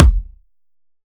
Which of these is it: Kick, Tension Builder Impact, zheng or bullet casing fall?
Kick